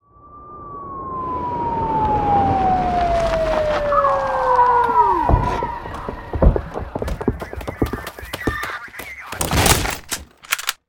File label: police arrival